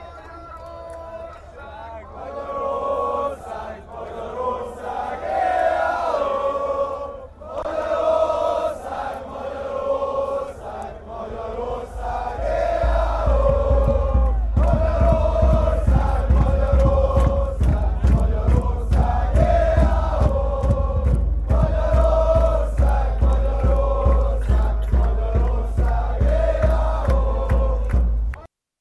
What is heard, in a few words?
hungarian football chant hungary ole